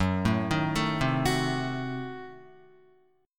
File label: F sharp Diminished 7th F sharp Guitar; arpeggiated; JGuitar